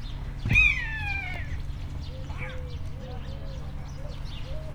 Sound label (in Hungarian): egerészölyv; Hortobágyi Nemzeti Park 2013